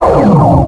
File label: iron; Pokemon Solar Light & Lunar Dark 1.0.1